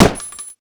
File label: G36C; VETERAN